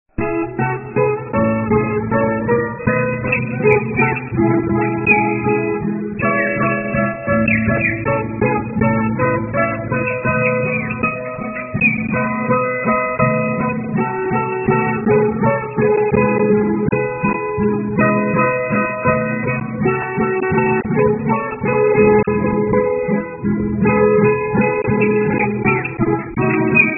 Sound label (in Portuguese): toque de viola caipira